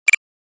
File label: Military radar antenna scan beeps; Military Equipment